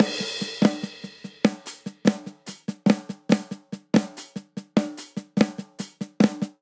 Heavy Rock Breakdown 145 bpm; MIDI Clips; Ableton; Factory Packs; Drum Booth

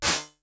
Splash Verb Max sweep, Reverbs; Convolution; IR Samples; Kontakt 5; Unsusual Reverbs

